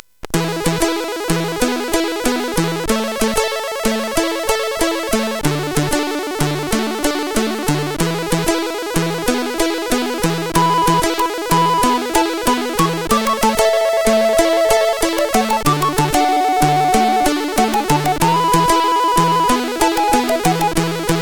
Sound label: Victory, CSG8580R5